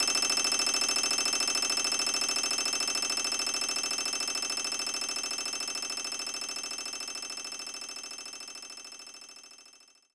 RESULT COUNT; maimai

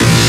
breakbeat

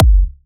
Kick; WAProd Free Kick Samples 2018; WA Kick Pack 6

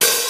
Open Hat; 音MAD; 孤独摇滚

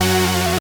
synth

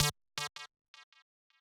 lead

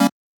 Chord

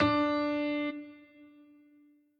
piano riff intro

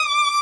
violin

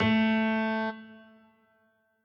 piano riff intro

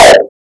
Kick hardstyle; 梁非凡

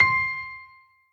piano echo

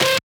wobble

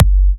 Kick; WAProd Free Kick Samples 2018; WA Kick Pack 1

